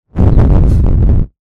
loud wind

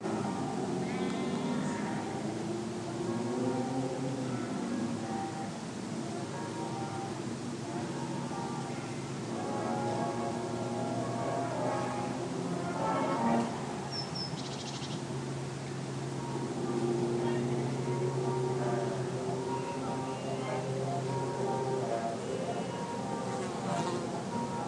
bikes band and birds

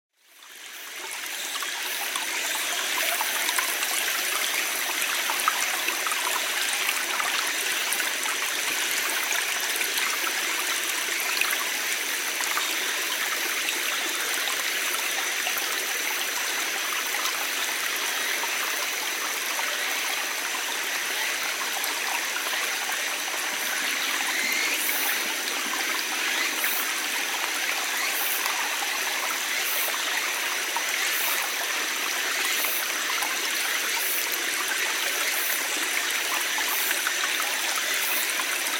cicada small stream

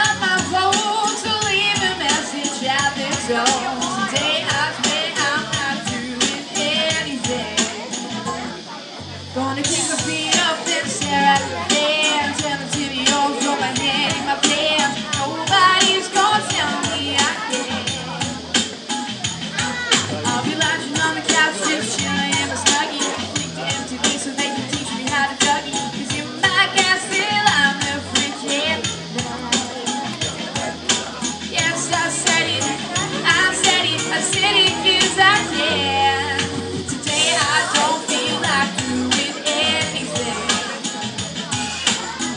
really good karaoke

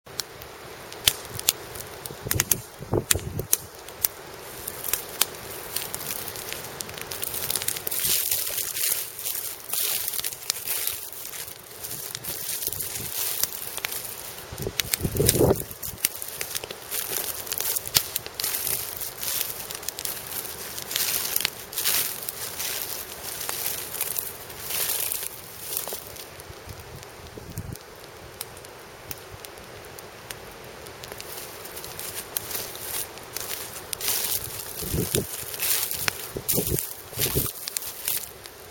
burning straw and stubble sounds

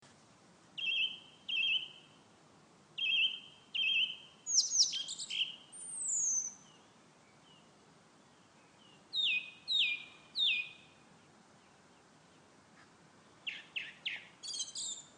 song bird; Takapuna

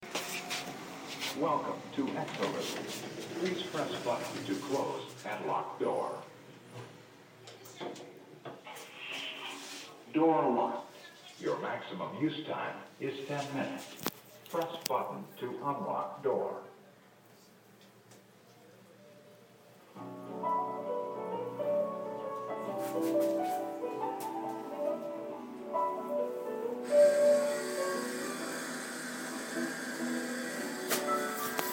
australian talking toilets

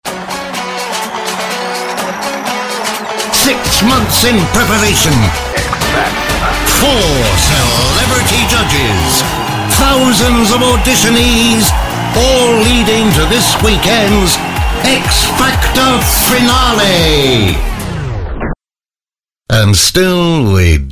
x factor excitement

